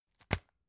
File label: tissue package drop soft